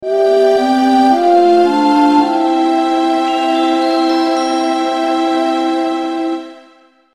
jingle

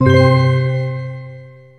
decide, electronic

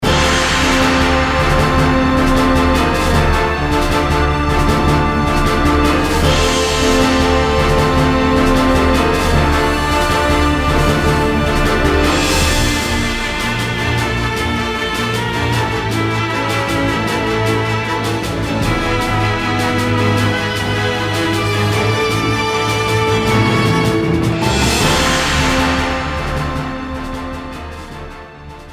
battle; tukito; srpg

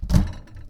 shelf open, real

